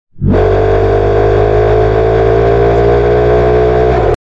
low pitch crying

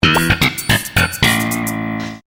sitcom transition bass riff; seinfeld

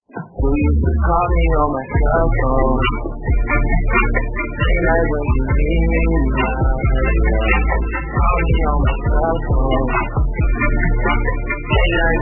cellphone

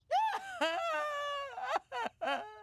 crying sound; smg4; marge

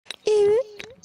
uwu

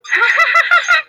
cute laugh

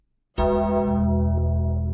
duuun

